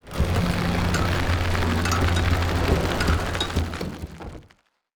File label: ropes pulleys; rifts; Stellaris; astral planes